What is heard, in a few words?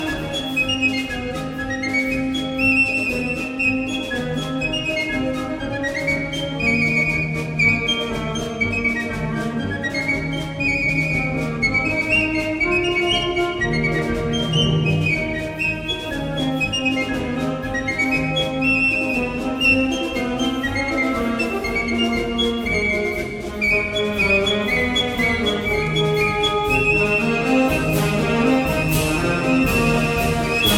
piccolo; INSTRUMENTOS MUSICAIS